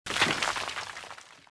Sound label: dirt, ambience; Star Wars Jedi Knight II Jedi Outcast; XBOX; artus